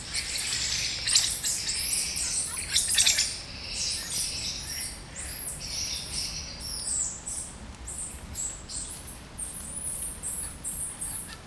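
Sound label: Saimiri sciureus aggressive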